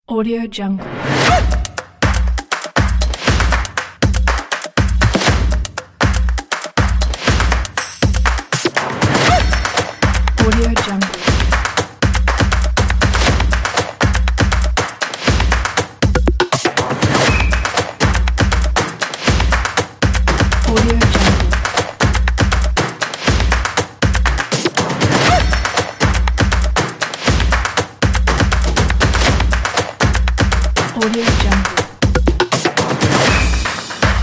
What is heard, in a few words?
drums and stomps